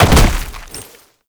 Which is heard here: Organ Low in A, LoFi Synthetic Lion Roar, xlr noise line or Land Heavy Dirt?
Land Heavy Dirt